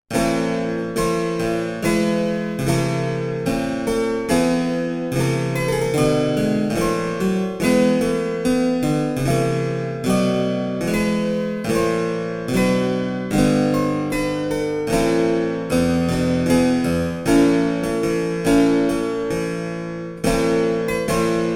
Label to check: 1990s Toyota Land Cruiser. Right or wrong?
Wrong